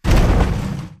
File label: bullet explosive titan shudder; Titanfall 2